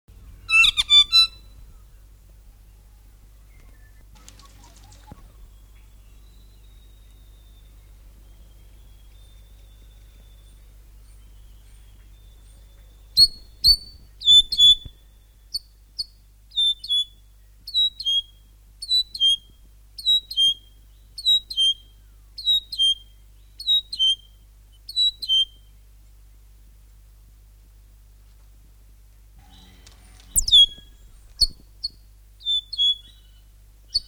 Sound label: north island saddleback song; conservation, native animals, birds